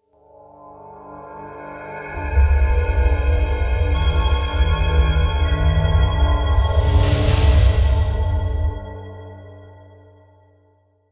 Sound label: soft